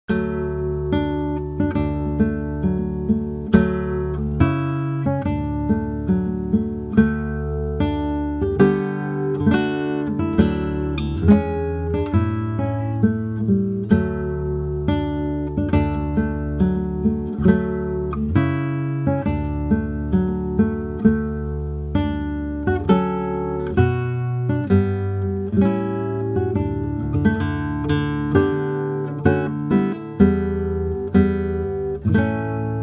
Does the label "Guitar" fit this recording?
Yes